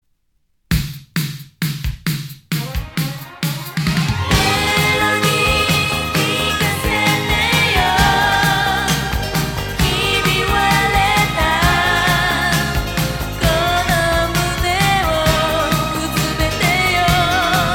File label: PIANO